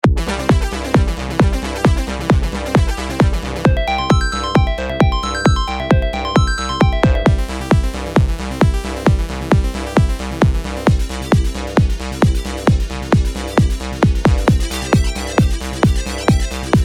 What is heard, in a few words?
ESQ1